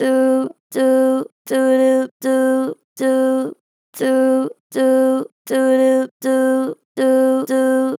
Vib Do Do, Vocals, Natural Vibrato Strong; Harmor Vocals

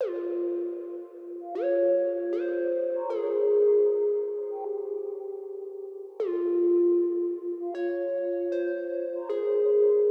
Nostalgic Melody Loop - 155 BPM F Min Bell; Melodies; Loop Stems; Cymatics; Oracle Sample Pack

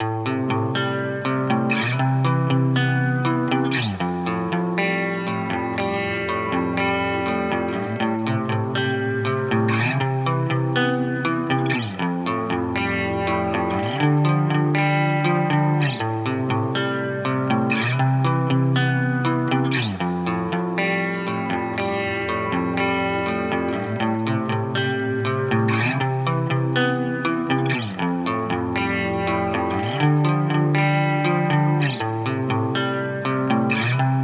120 BPM A Min Main Guitar; Loop Stems; Cymatics; Grief

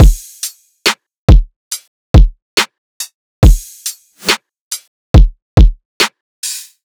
Riddim Drum Loop 140 Full; Ghosthack; Riddim Essentials Volume 2

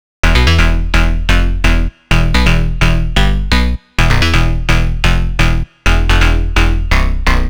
Bass Loop 128 E; Ghosthack; Ricochet

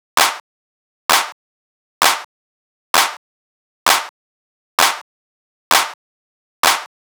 Clap Loop 130, Techno; Ghosthack; Free Techno Sounds 2024

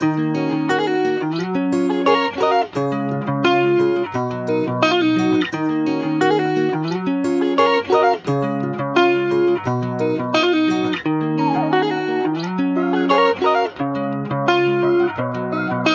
Journey Guitar Loops, 87 BPM E Min; Raindrops; Cymatics

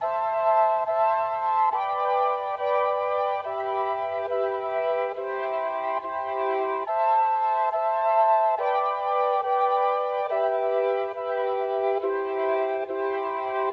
Rhodes, 140 BPM C Maj; Stems; Cymatics; Green Fields

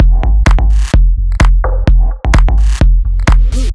Underground Drum Loop - 128 BPM F; House; Full Drum Loops; Cymatics

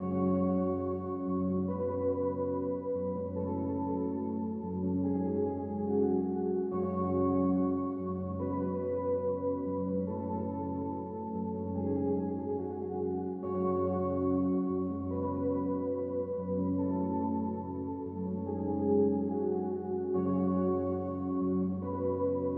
143 BPM F# Min Keys; Melody; Loop Stems; Cymatics; Cymatics - 2022 Melody USB Expansion; Vacant

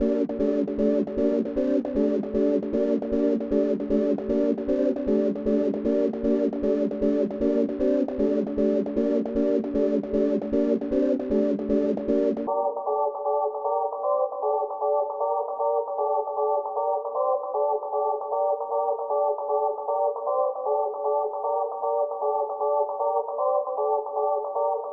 154 BPM G Min Main Chords, melody loop stem; Trap; Loop Stems; Cymatics; Bullet; 2022 Melody Collection

